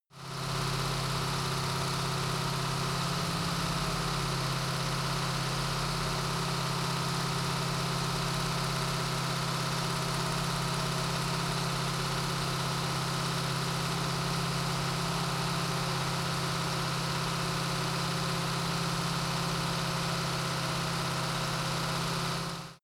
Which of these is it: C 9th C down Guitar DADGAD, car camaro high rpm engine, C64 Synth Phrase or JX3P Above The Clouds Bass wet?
car camaro high rpm engine